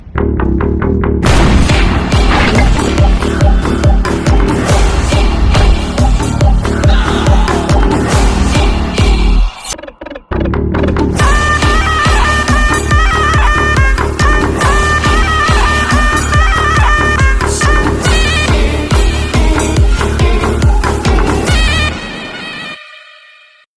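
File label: background music ringtone; CHAVER MALAYALAM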